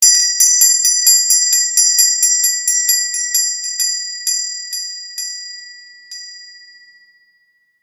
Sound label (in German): audio glocke